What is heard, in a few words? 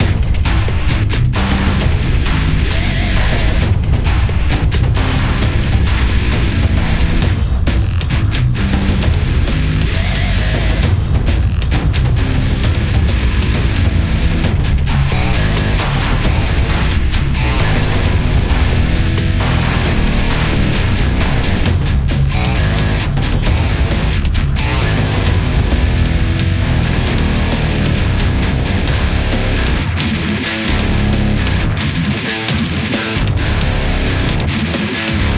battle arcade; Let It Die